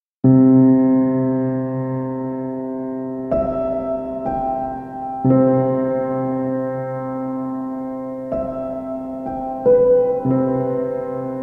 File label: Piano Sounds